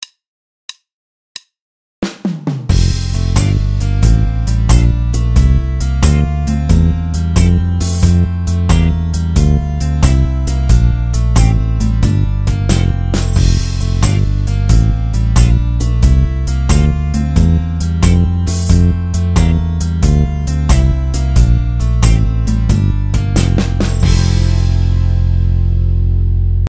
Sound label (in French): accents exercice, basse; exercices